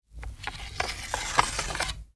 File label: medieval katana sword draw from sheath slow; still north media